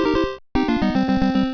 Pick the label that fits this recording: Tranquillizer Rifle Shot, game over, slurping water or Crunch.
game over